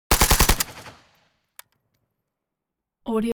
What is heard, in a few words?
weapons rifle ak47 exterior fire burst close